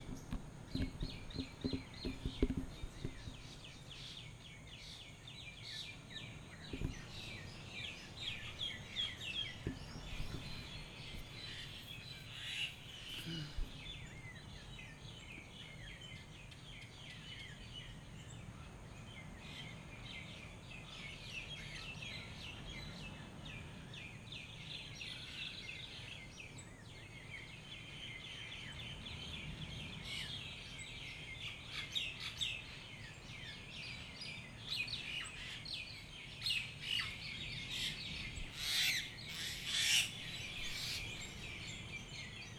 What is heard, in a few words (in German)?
Vogelgezwitscher Seychellen